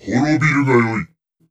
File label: voice monster